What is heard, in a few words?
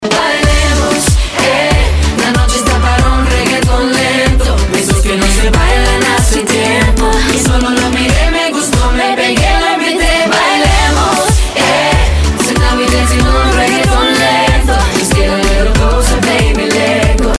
hook, reggaeton lento